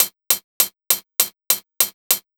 100 DRM SN, Pure Electro; USB Soundscan vol.03; AKAI

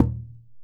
OKE-DO, JAPAN PERC; AKAI S6000 CD-ROM; WorldSounds

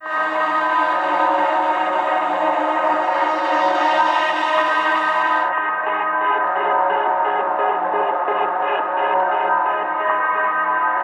Racca Pad 174; voxandchords

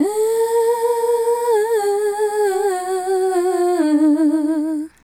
Female Group, Hip-Hop and RnB Vocals

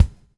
BUBL, BUBBLE; Spectrasonics - Backbeat

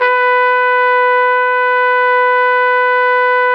Flugelhorn, Solo Brass

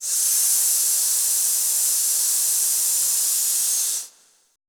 VOICELESS, Choir

